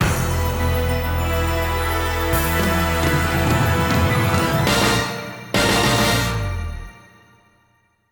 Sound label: Stage clear; Super Hero Generation; PS Vita; PS3